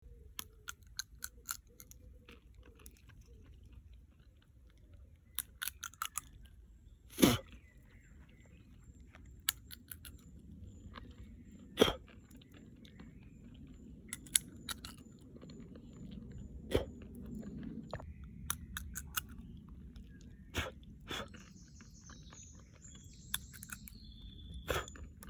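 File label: sunflower seeds street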